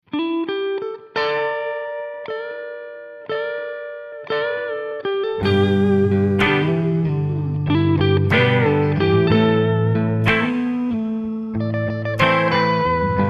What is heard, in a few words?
Bluesy